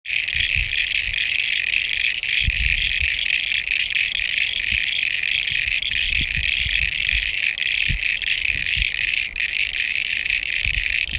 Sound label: Frogs near Naas Creek